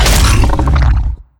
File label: attack pounding; attack of the radioactive thing